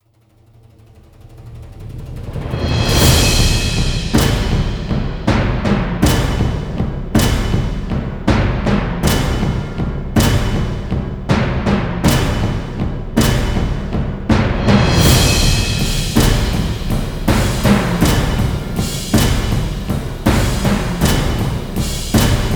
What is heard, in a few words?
drums